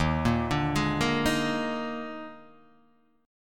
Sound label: D sharp Major 7th Suspended 4th, D sharp, down; arpeggiated; D#M7sus4; JGuitar